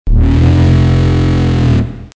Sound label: elevator; maoudamashii